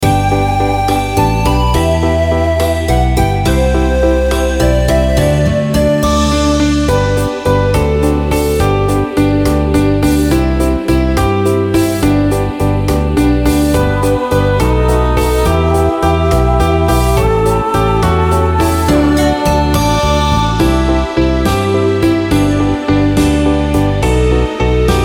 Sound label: karaoke